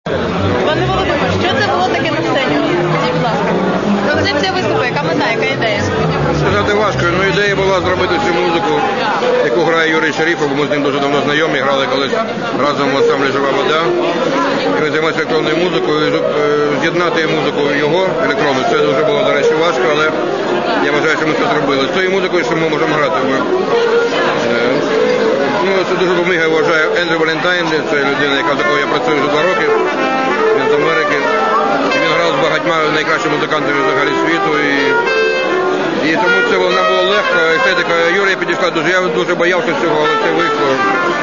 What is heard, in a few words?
piano int